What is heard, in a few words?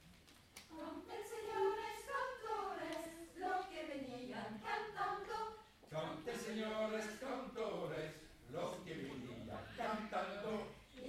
canten senores choeur; choeur theopolitain a villegongis